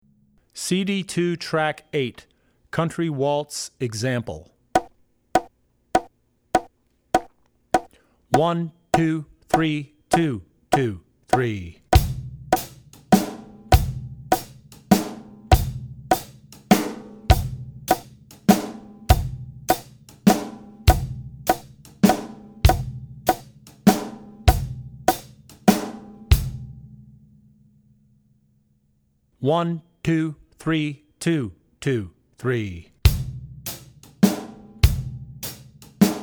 Country - Country Waltz; Drummer's Bible Tracks

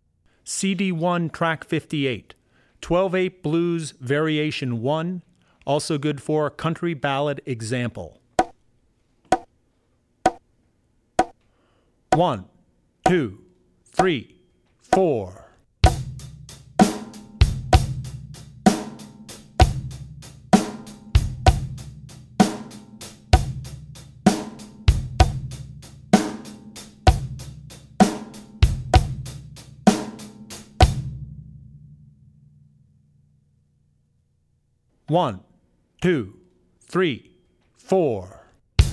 12/8 Blues Pattern; 12-8 Blues Pattern (Variation 1); Drummer's Bible Tracks

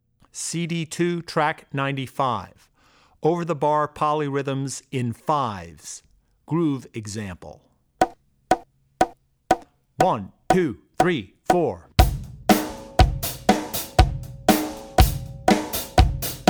Polyrhythms - Over The Bar 3s; Drummer's Bible Tracks

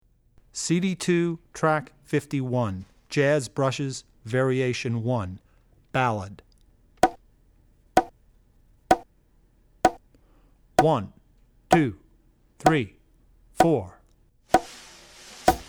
Jazz - Jazz Brushes; Jazz Brushes (Variation 1); Drummer's Bible Tracks

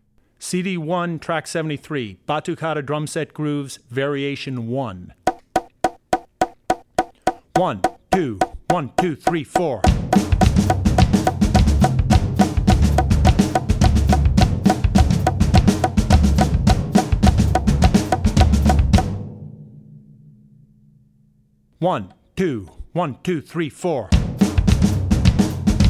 Batukada for Drum Set; Batukada for Drum Set (Variation 1); Drummer's Bible Tracks

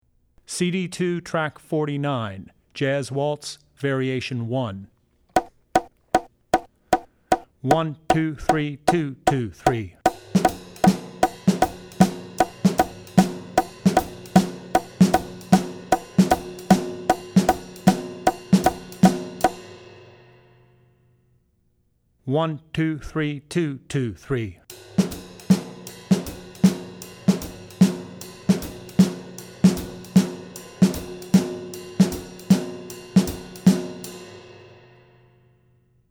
Jazz - Jazz Waltz; Jazz Waltz (Variation 1); Drummer's Bible Tracks

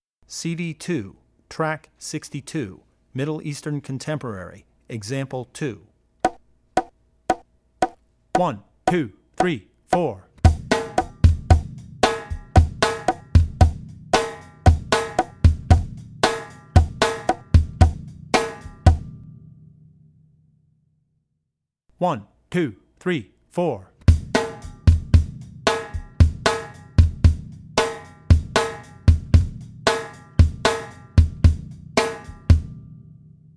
Middle Eastern; CD2 Track62 Middle Eastern (Example 2); Drummer's Bible Tracks

